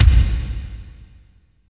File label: KICK, Tekno Industrial; East West